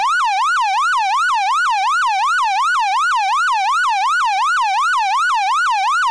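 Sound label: Federal Signal PA640 Wail, Siren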